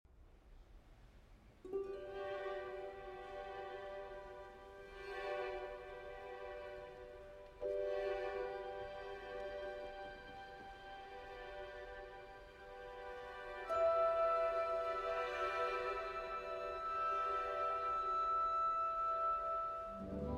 Concerto for Violin and Orchestra in D Major, Romance Andante; Op.35 II.